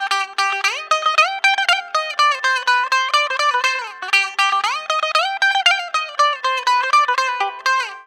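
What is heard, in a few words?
electric bouzouki; EL.BOU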